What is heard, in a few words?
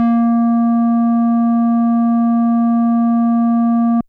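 FM Sq Dist DX100 A#1; square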